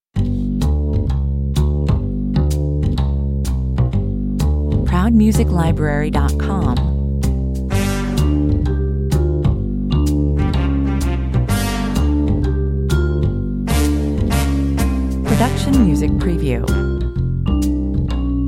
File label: retro spy, no guitar